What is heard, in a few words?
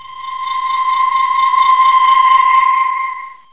GAME CRY